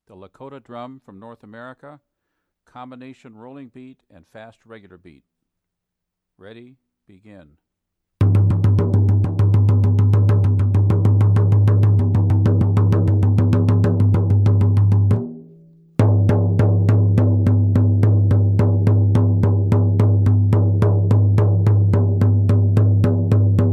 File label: Rolling beat fast beat